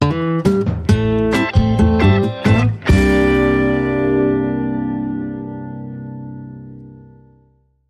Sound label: Rio Style